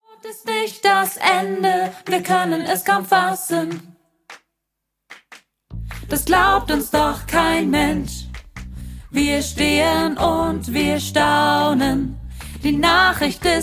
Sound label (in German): Lern Alt